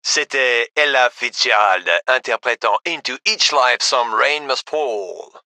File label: music